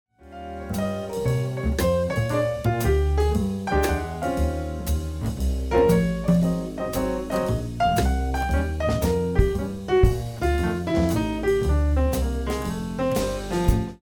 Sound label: lost round